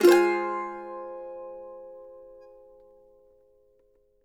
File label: mando min; Sampleheads; Whole Lotta Country 1 & 2